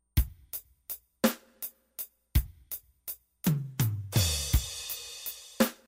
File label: crash fill pattern; Programming Drums On The BR-1600CD